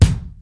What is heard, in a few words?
treble rich bass drum D# key; kick drum